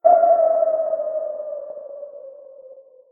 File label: sonar deactivate